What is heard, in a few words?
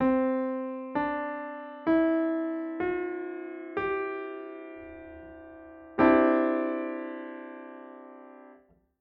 Otonal Pentad JI